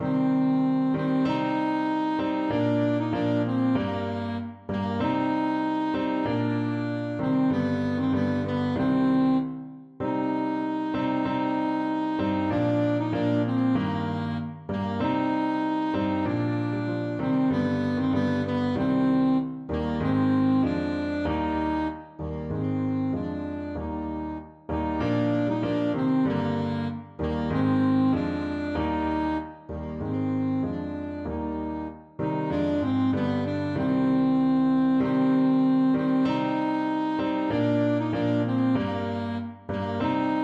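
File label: alto saxophone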